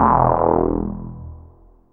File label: BOW; BEAT2000